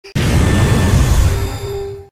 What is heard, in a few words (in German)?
Ruf